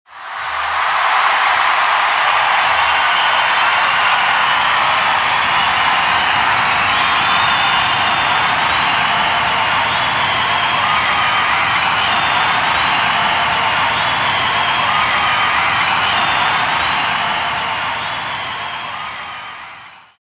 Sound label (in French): Public concert; bruitage